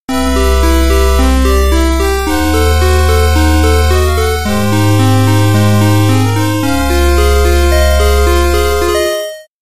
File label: Sleeping Player Jingle